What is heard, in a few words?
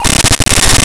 sonic stop; Scratch